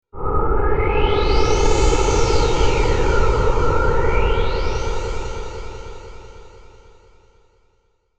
Vectron Digi Blow

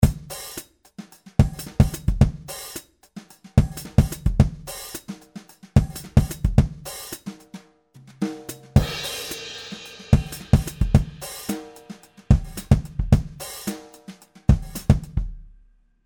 1958 Brush Kit; Factory WFL

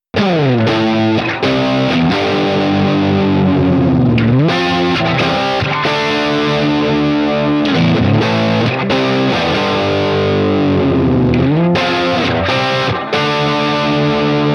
Crunch

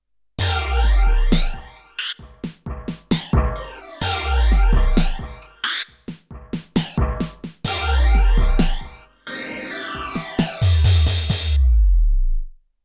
Octapad

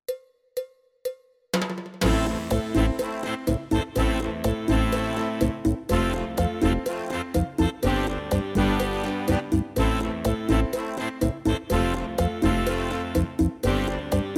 Yamaha PSR SX700 SX900 Style Cuban Cha Cha

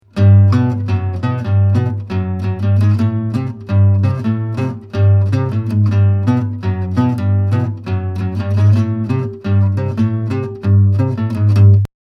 Latin Riff

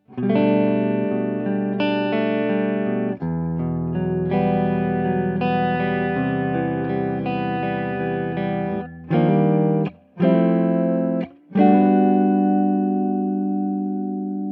Boss DC 2 SDD 4 Stereo

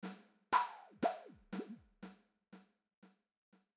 filtered delay

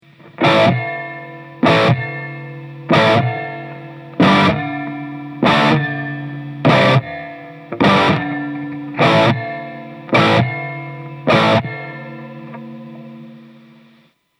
Spring Ring

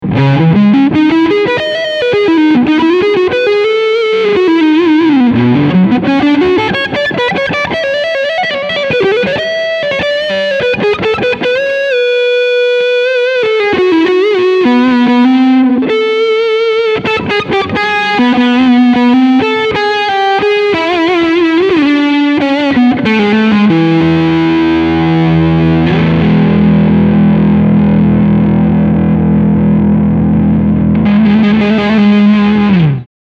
Leadsound Neck PU

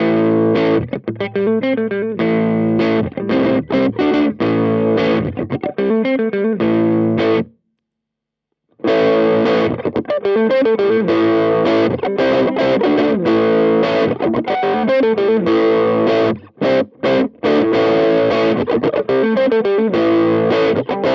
Clean Boost Mid; Compadre

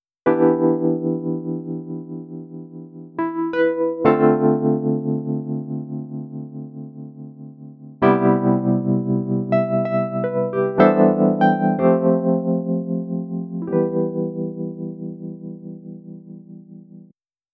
CA67 60s Piano

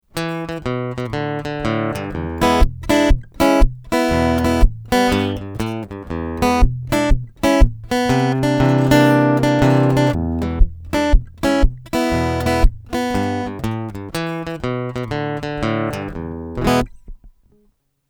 Woodland Plek EQ aus

